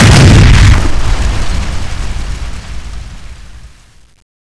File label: revenant fireball explode; zombie giant; cstrike